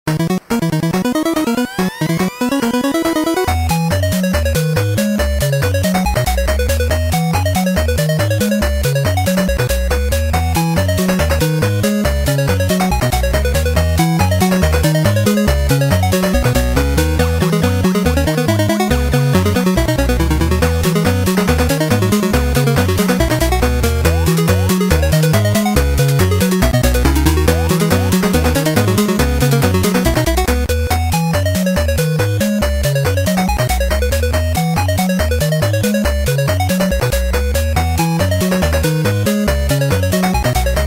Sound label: nes version